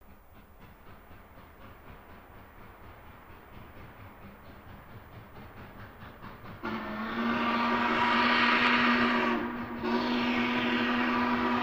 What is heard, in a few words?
Steam Train By Horn, steam train